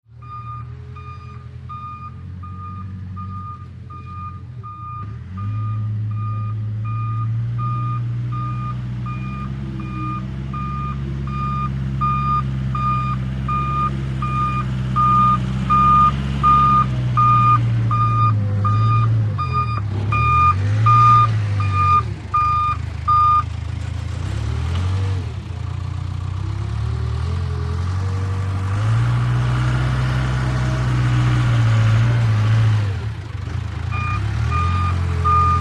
VEHICLE - CONSTRUCTION JOHN DEERE 750: EXT: Approach in reverse, maneuver close up, dig rocks.; 750 Dozer